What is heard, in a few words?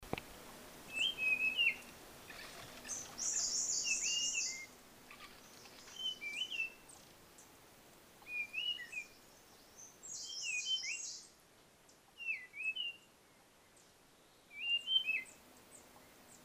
blue-fronted robin